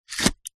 cafetiere plunger lift fast coffee inside; household; zapsplat

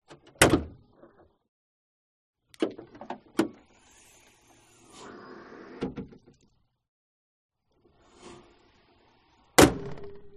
AUTOMOBILE S-TYPE Jaguar Hood; 2000; fox audio

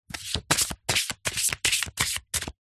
playing cards shuffle fast; zapsplat

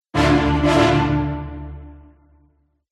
ORCHESTRA Jingle Brass Hit Roll Out; MUSICAL; smartsound

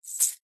industrial chain small catch hand

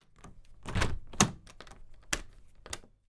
household caravan front door handle lift deadlock

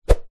cane whoosh through air whip; foley; zapsplat

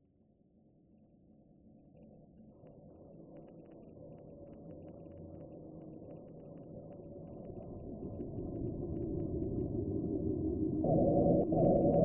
weird retro vintage style synth drone modulated; scott lawlor

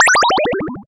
game lose negative

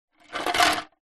ice cubes movement slide in plastic tray; food drink

